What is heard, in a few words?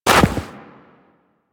Run Deep Snow, Footsteps; Warframe; Operator